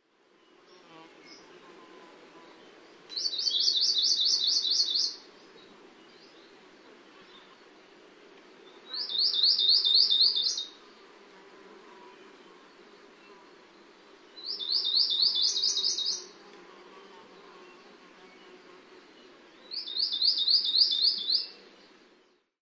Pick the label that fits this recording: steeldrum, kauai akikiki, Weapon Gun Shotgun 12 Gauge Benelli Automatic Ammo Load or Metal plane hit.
kauai akikiki